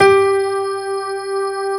GRAND PF G 3, AKAI S-Series

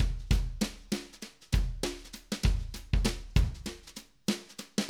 Acoustic Live Drum

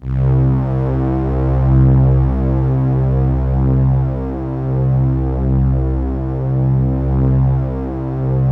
PAD C2; USB Soundscan vol.05 - Explosive Jungle; AKAI

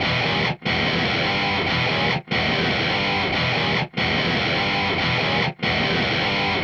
Hardcore Breakbeat, 145 Emin; Big Fish Audio; Hardcore Breakbeat Action; Breakneck

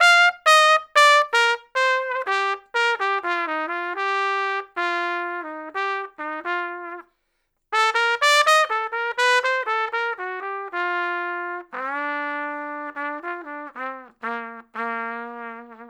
Trumpet Solo, Jazz Traditional

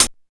HAT 2; East West; Phat & Phunky

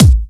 Dubstep Kicks

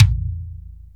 Kick, Akai MPC500; Toolkits

